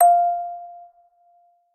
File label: musicbox